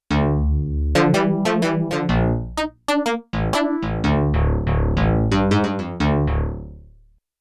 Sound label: Stereo Band; Rock Performance 2